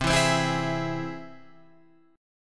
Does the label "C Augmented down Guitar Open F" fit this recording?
Yes